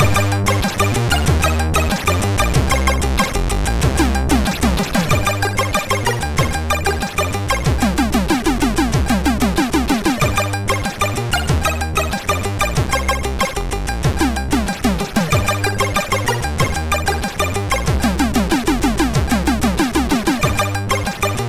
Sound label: intro, MOS6581R2